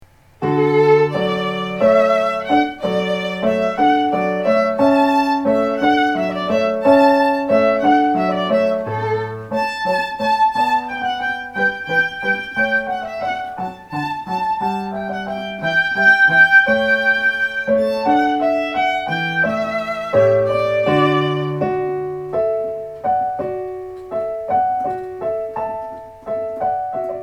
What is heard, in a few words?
Hornpipe